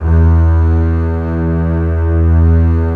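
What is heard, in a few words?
bass E2; Digital Sound Factory Virtuoso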